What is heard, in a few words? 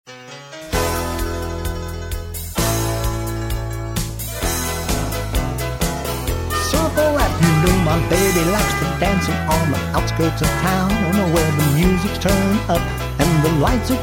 vocal